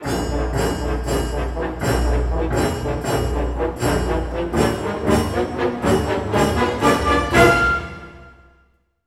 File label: Orchestral Loops; 128 STRAVNSKY